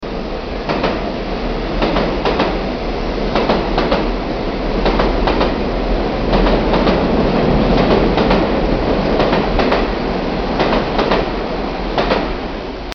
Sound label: Subway Train Passing